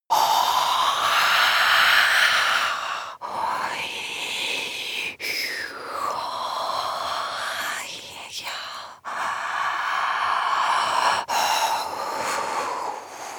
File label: breath female eerie sequence